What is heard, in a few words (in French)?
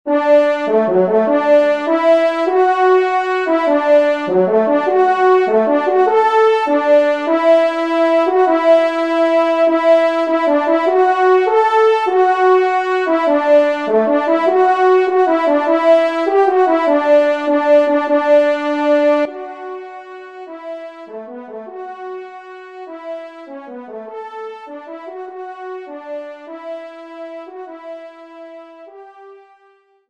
Sortie, trompe; Devert; Sortie 1e Messe